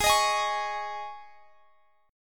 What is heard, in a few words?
Gsharp Suspended 4th